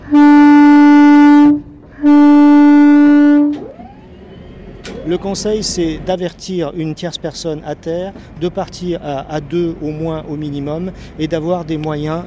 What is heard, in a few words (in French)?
son snsm ambiance, bruit bateau